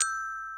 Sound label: gloken